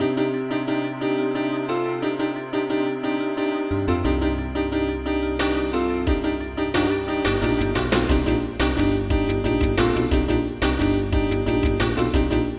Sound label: game over; mixed asset thread